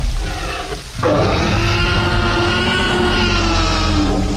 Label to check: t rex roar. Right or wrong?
Right